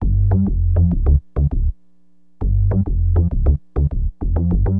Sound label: SYN BAS, Acid Jazz Funk